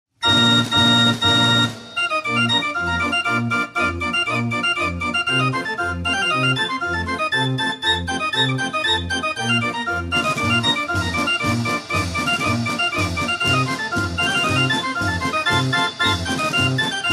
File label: Clarinet Polka